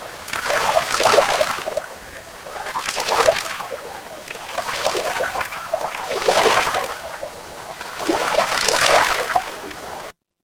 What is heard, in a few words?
ambience ocean